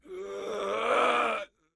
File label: clown gasp; Barotraumatic; Deepseaclownnew